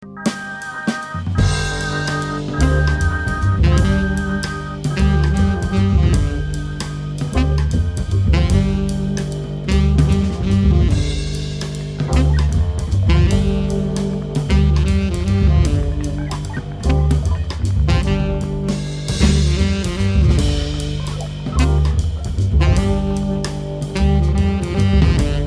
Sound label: Cinematic Orchestra Waltz